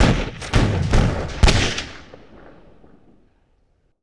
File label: Musket Scattered Shots, Period Combat; Sony Pictures Sound Effects Series